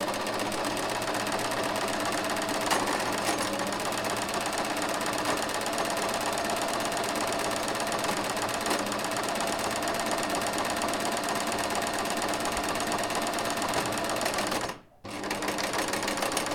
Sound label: Electric Sewing Machine